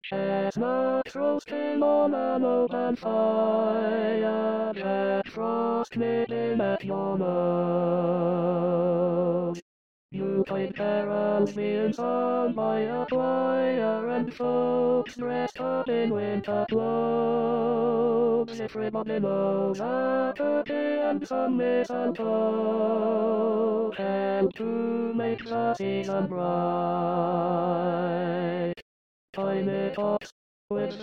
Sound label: Tenor SATB